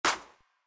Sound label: Clapz; Remix Sample Pack